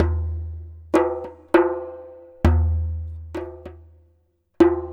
Copper Dumbek, Ethnic Percussion; Ambient; Sonic Foundry (Sony Creative Software); Ambient Atmospheres & Rhythms

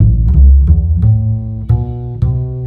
ACID JAZZ

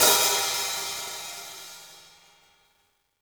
hihat - opened; JazzFunkKit

